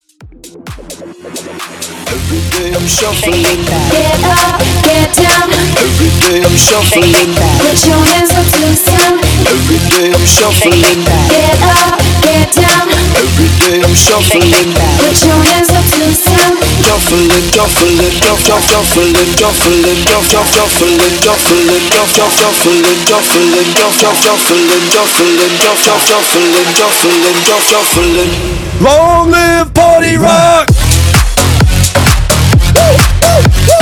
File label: Club Party Hype In Outro 130 BPM